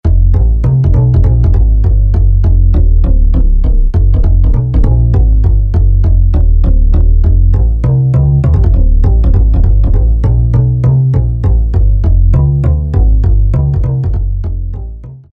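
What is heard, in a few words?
Upright bass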